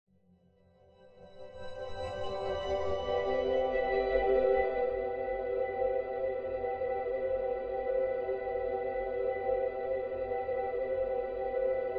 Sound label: ambient